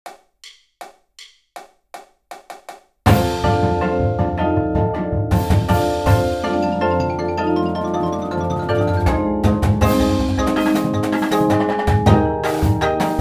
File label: PERC